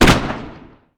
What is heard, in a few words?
weapon